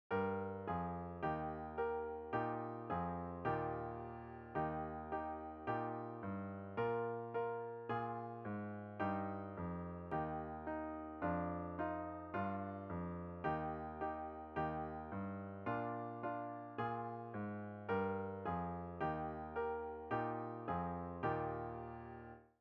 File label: Solo One Eb brass and Clarinet; first con 2024 stage 1 2 3